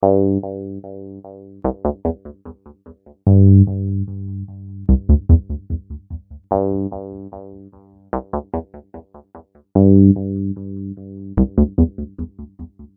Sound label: synth